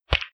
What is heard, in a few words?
Zoom Sound